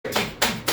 punch sound

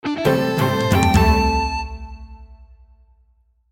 news opening

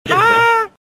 haaaa

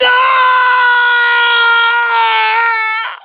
scorch aaahhhh

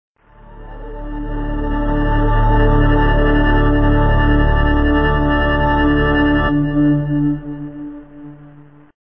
dramatic

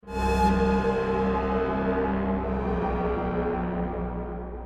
vampire sound

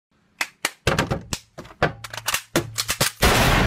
cup song gunshot

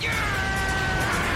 intense yelling

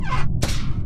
vent

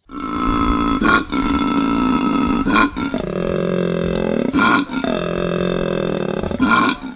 koala bellow

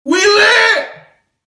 text tone

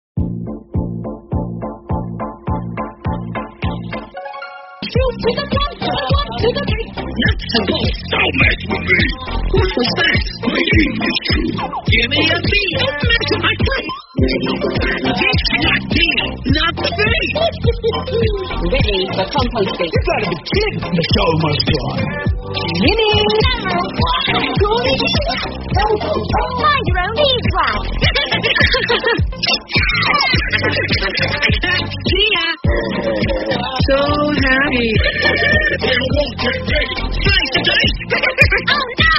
rap low quality; brawl stars

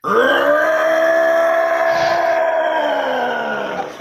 titan roar

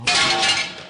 glass breaking clay

